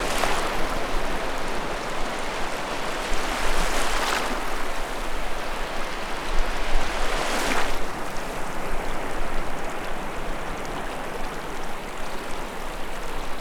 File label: ASMR Tidal Waves